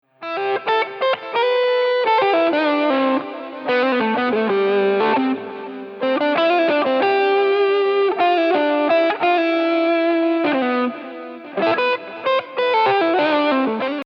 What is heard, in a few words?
Lead